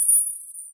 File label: crit emit; matmos interactions